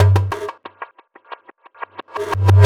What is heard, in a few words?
Tactile Impulse, Processed Drumkits; Sonic Foundry; Zero Gravity Beats